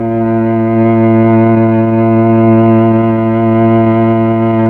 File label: STR, Melo. Cello, Slow Cello